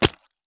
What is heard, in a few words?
sprint gravel; Escape from Tarkov